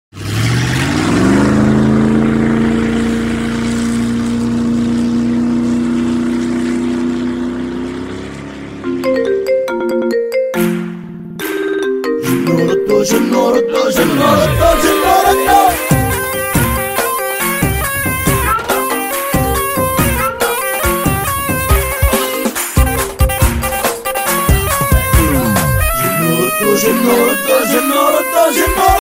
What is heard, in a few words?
best arabic iphone